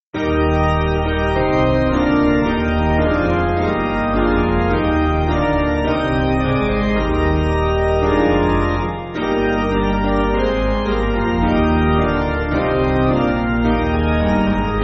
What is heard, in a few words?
Piano, Music Backing Files for Churches; Basic Piano & Organ (CM)